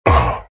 pain, vo; arc warden

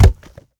cask bounce; witch doctor

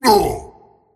pain; tiny

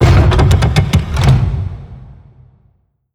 mech open, crafting; ui

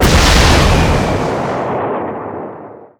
call down impact; weapons; dota; gyrocopter

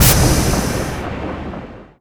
illuminate discharge; weapons; dota; keeper

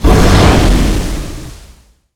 fire; weapons; dota; dragon knight

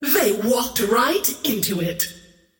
scream great; queenofpain

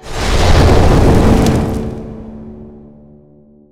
flame guard cast; weapons; dota; ember spirit

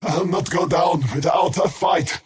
skywrath crown attack pain; skywrath mage

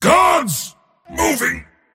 move pain; dota; mars

